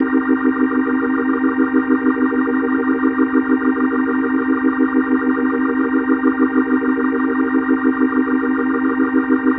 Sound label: Organ C Min7; Ambient Cinematic; Organ Licks